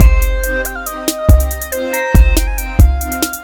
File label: victory music; smite; kuzenbo